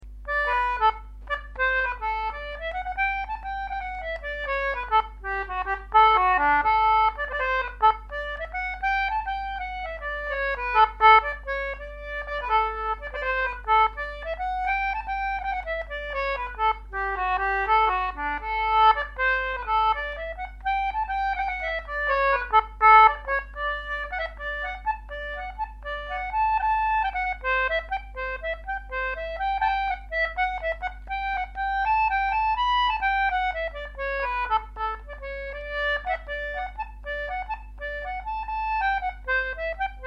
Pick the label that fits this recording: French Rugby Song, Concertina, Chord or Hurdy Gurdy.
Concertina